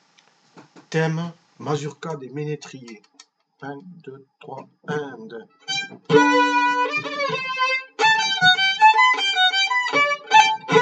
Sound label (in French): Mazurka des menetriers theme